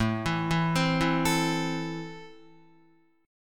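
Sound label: A Minor, Guitar, chord strum; JGuitar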